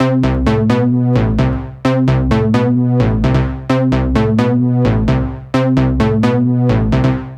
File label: NRG Arp, trance